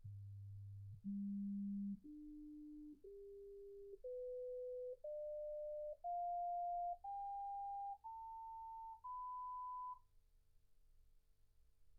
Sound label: sinus tones 48kHz